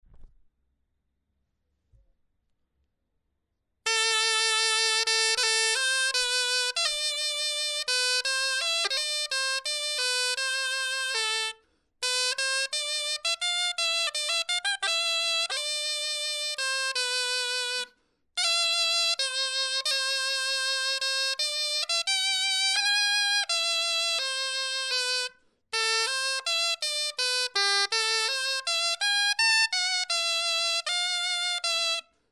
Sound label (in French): clarin etude